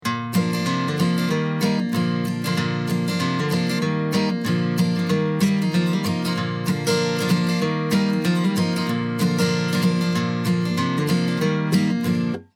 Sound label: mono; create a doubled stereo track from a mono source